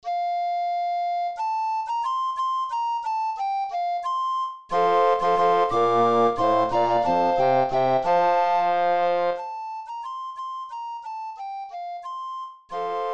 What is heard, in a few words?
recorder quartet